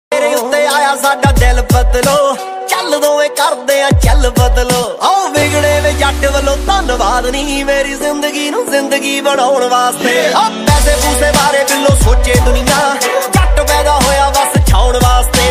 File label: punjabi song ringtone